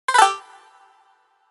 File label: Collect Skill Honeycomb; Banjo-Tooie